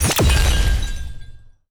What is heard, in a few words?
skill sparklight funnel explo